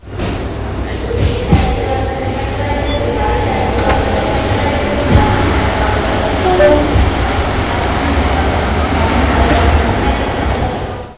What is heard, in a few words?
grand station